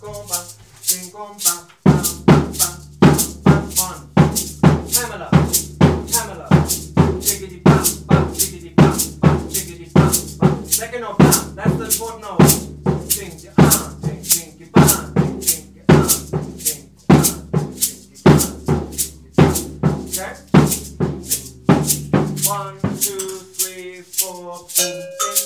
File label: samba Timbas Drum